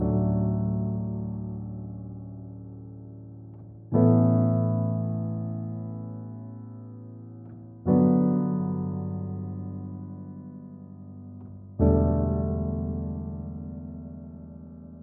122 BPM Piano Chord Progressions Cinematic Drama Dmaj Bmin Tonic A; MMB